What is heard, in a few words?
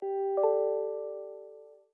Notify Messaging; Windows